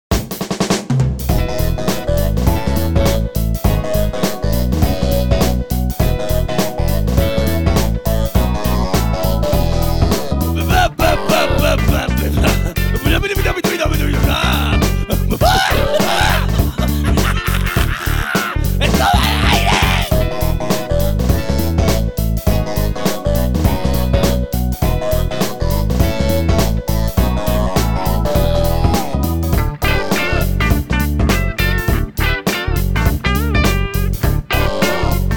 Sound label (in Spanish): cortinilla instrumental; de todo al aire